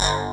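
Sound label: PULSE SAW BASS; Pro-Rec - Synthsonic; TRINITY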